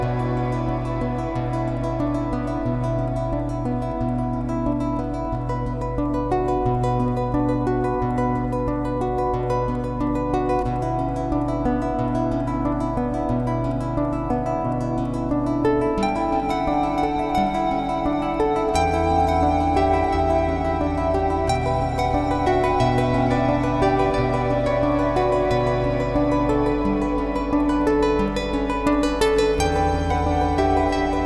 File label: loop; ad infinitum 008